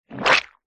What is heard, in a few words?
cartoon splat squelch wet; zapsplat